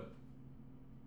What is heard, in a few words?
reamping; radio 101